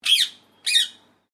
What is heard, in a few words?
Bird Parrot Indian Ringneck Chirp Sequence; Ringneck Parrot in Horsham Victoria; Birds (Sound Effects:Animals)